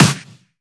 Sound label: snares; osu; VEH3